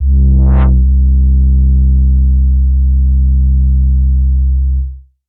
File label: Attack Bass C2